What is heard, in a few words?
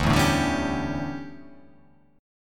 D Major 7th Suspended 2nd Suspended 4th D